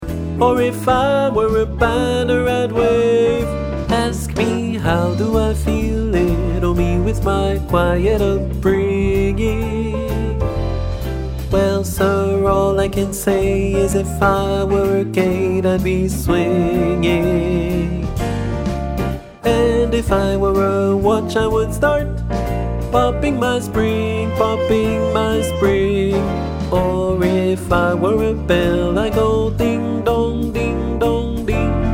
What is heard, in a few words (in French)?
Basse, ensemble vocal jazz; ensemble vocal jazz de larquemuse